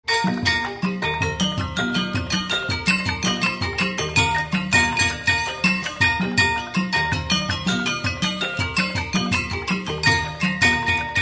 anklung; banyuwangi

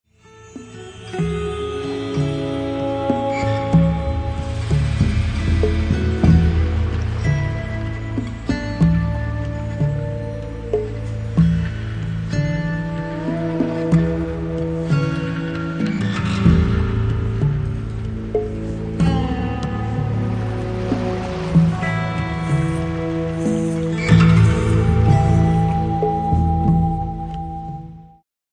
healing

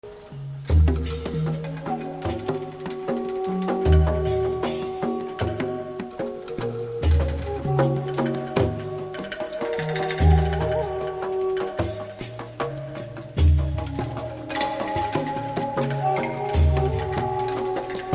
bamboo, pre colombia music

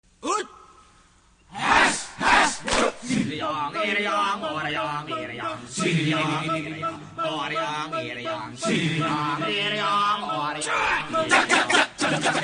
kecak

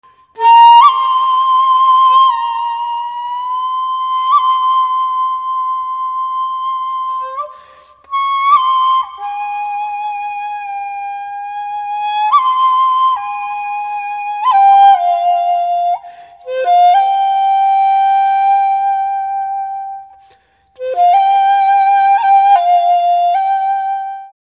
shaku; kotobuki

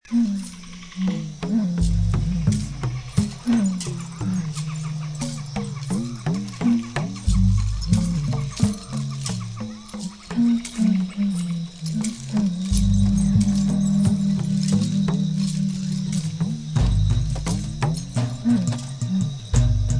gorilla, jungle drums

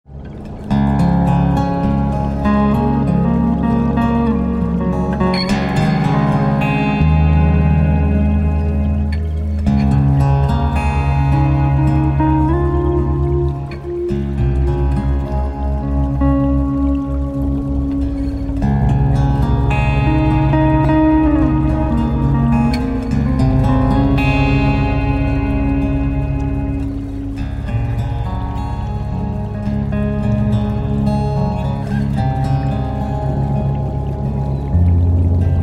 Guitars of Light

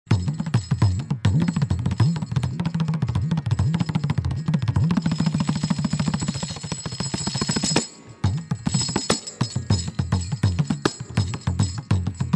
kanjira, drums of india

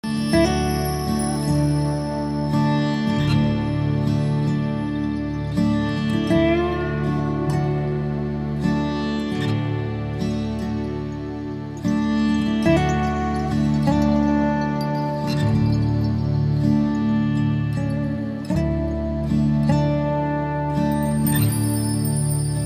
indigo, guitar sitar

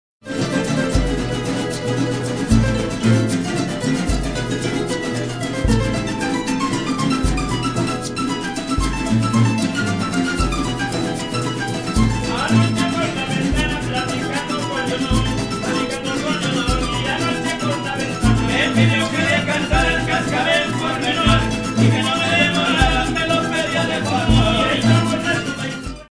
cascabel, jarocho

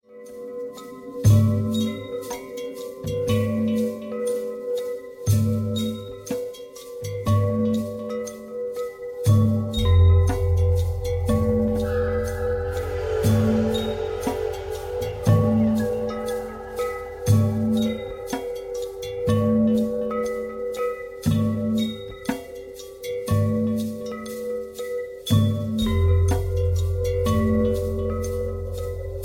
Turquoise Skies, windchime

